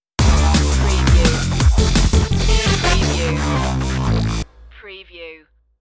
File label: stomp stinger